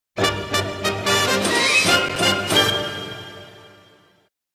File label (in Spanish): Tema de victoria; Metal Gear; SSBB